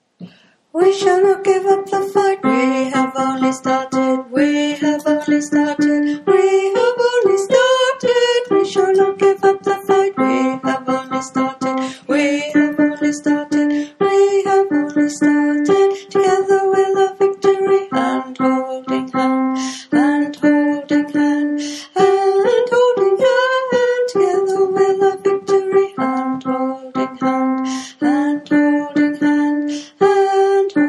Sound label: We Shall Not Give Up bass